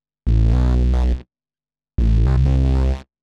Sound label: SNTH BASS TEKNO 140 A